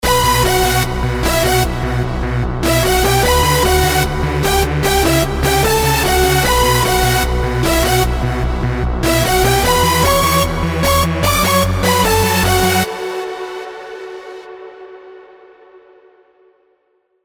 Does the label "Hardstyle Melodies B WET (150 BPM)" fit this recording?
Yes